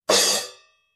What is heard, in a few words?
Stopped crash hit